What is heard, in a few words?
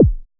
JUNO HI Q; HIQ+ZAPS